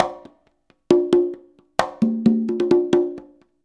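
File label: 133.5 CGA, conga; Univers Sons; Basicussions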